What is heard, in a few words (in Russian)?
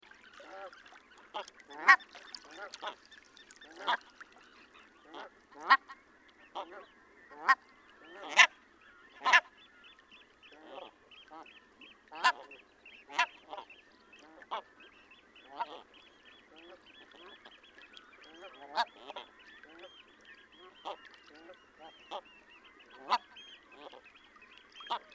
beloschekaya kazarka golos